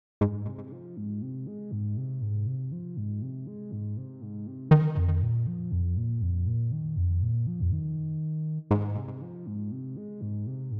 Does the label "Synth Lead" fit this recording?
Yes